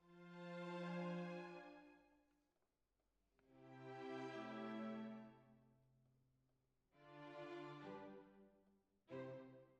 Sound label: Clarinet